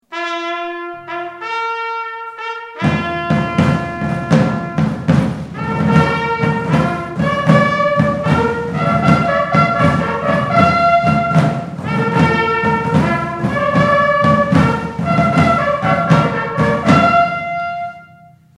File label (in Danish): kendesignal for arteleriet